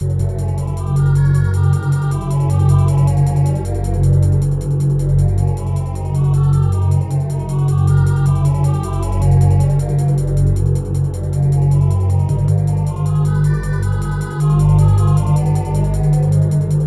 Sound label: Conversation; Majin Tensei; SNES